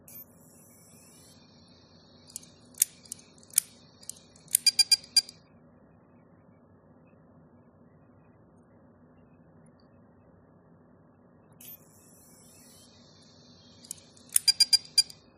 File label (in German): Western Digital Festplatte klackert